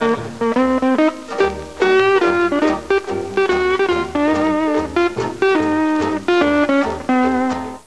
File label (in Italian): musica strumentale